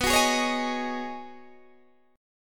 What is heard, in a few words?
B 6th Add 9th B Guitar DADGAD, chord